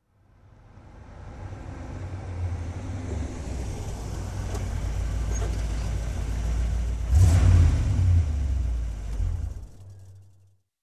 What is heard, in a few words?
Car approaching and engine running